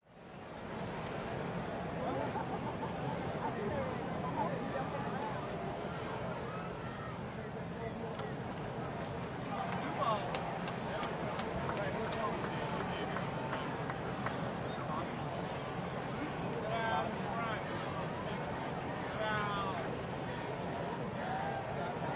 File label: Sports Arena Baseball Ambience Stands Upper Deck Crowds Cheer Chatter; Baseball Sound Effects